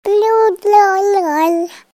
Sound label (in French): "bludloloill", un seul mot BLUDLODLOY préverbal de ma fille avant sa 1ère année de vie; plein de mots préverbaux de ma fille avant sa première année